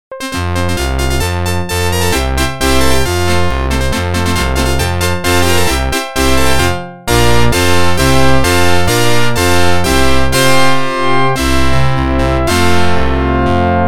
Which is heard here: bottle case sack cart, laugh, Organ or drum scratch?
Organ